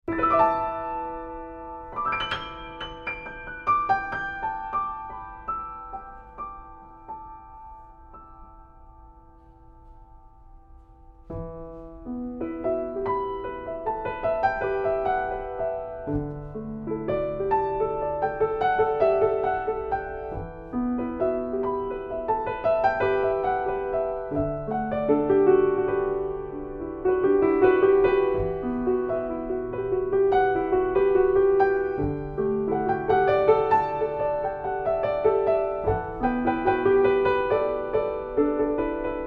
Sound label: Steinway S Baby Grand Piano; Michelles Piano in Portland OR